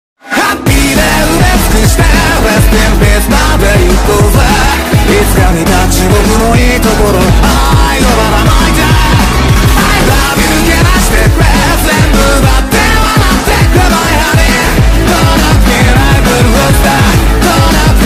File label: Ringtone; Chainsaw Man